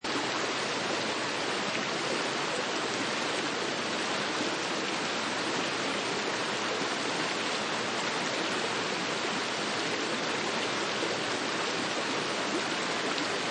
River Long Loop, An evenly flowing, medium intensity river or stream flows; Seamlessly looping sound; Forest; Lynne Music